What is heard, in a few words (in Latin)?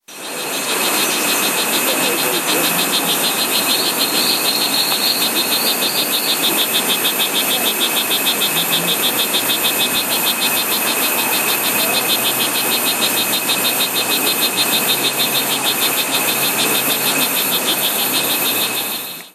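Dendrobates pumilio, Costa Rica; Limon; Silveira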